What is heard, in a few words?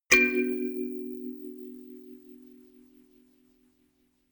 notification kalimba rattle triad minor low